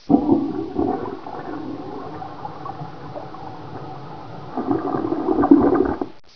muffled toilet, ambient; wartso

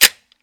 slide, glock; guns; cof

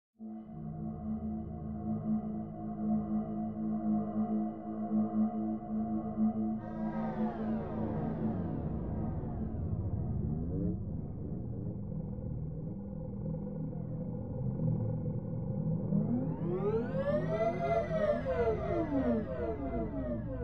future song

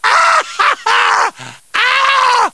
scream; deadpool

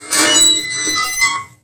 squeaky, raccoon

